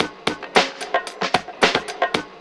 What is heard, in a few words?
LOOP, DRUM; E-Lab; X Static Goldmine 2; akai